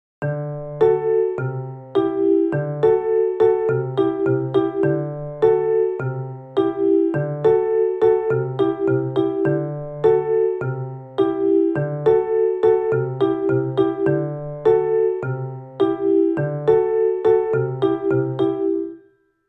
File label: Caribbean Example; modes scales and student improvisation